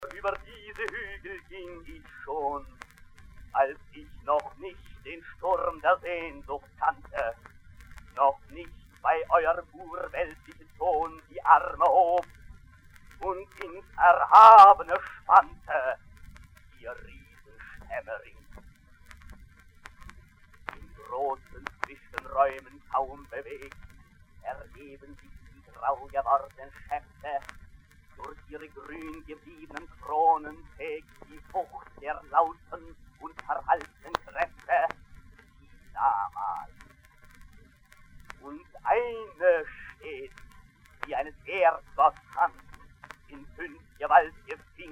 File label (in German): harfe